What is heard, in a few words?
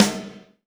MD PIC RM, Drum Mashines, SR-16 DRUMS; EdgeSounds